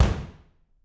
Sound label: BD, Drums; Bob Clearmountain Drums I